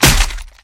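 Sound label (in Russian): сброс оружия в одну кучу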